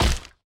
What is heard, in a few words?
hit organic deep; CrossCode; battle